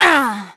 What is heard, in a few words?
GRUNTS; VOICE; CARENA; Pulse Racer